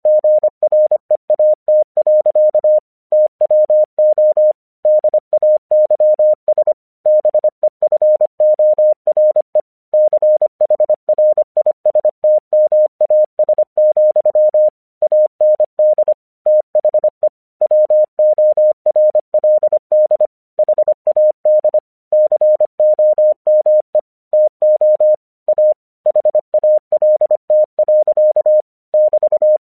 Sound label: English 25wpm